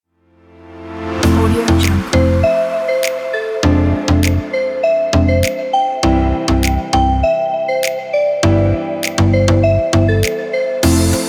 summer romantic pop

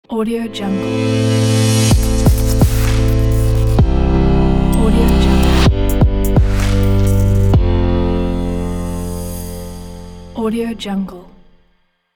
electronic intro logo

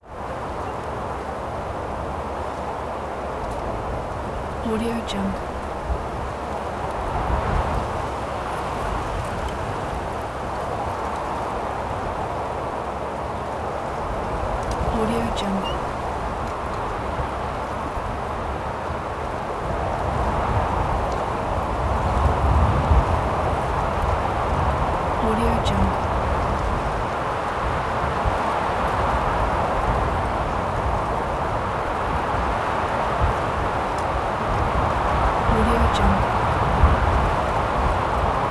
desert wind

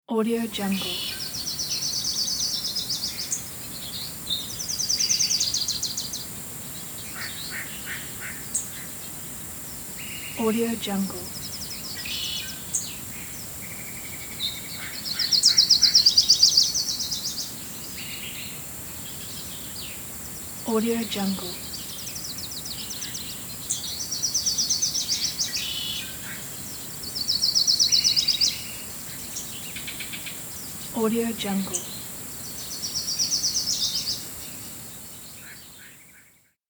forest ambience with birds

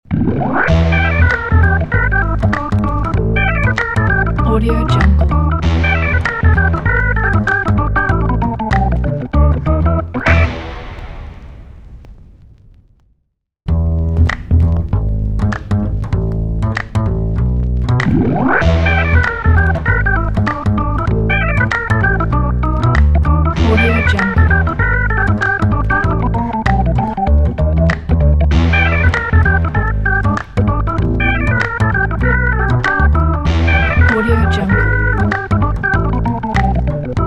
vintage organ and claps logo

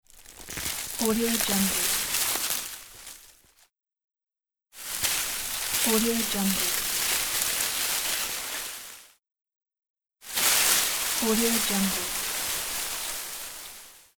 plant rustle

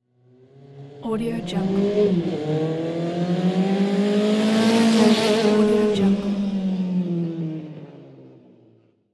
motorcycle 900cc passes by

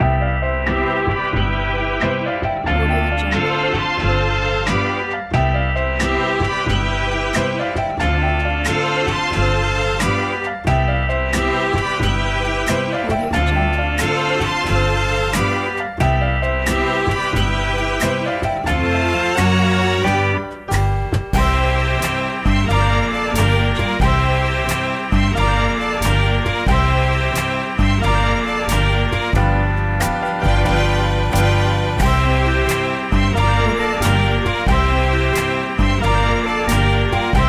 vintage tunes; sounddesign

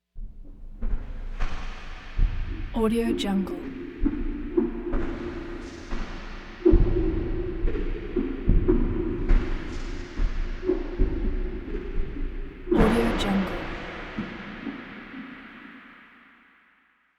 tension wing whooshes chaotic

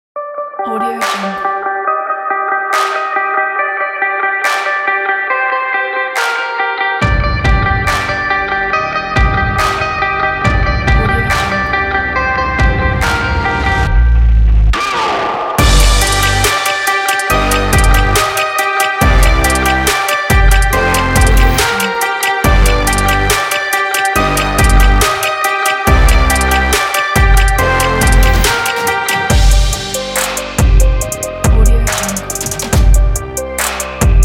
action epic sport

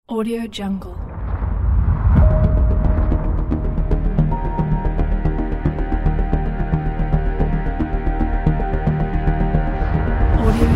dark introduction; wolfgangwoehrlemusic

